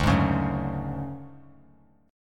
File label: D Major 7th Flat 5th D down 3C 3F 3A 3D, chord; strummed; JGuitar